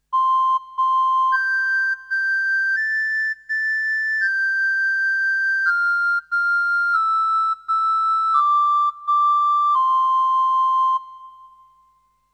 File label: ocarina